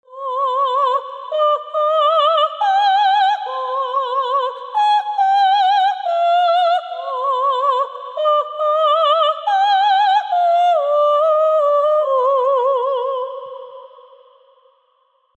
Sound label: Vocal Tranquil Tears